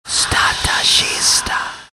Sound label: hallow, ambient; Garry's Mod; tf2